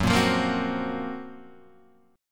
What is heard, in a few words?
Gb Major Sharp 11th, Guitar Standard; GbM#11 strummed